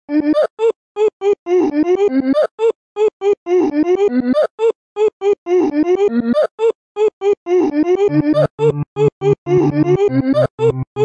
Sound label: AHHH